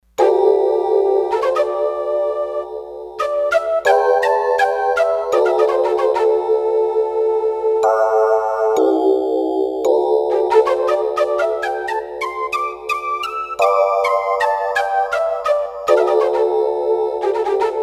Japanese Duo, Roland D-10; Factory Patches

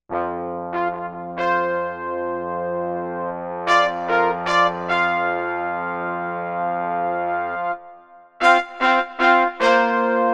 Orch Trpts

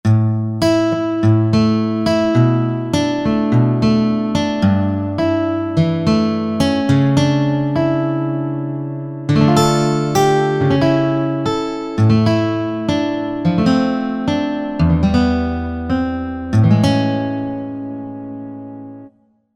Steel Gt

